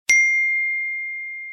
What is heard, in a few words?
Iphone Ring